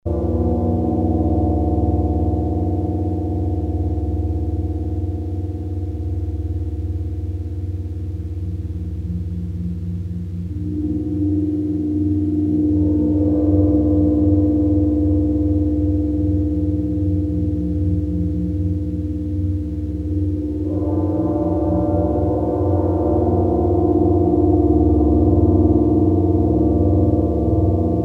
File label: prepared aeolian harp balls; inharmonic series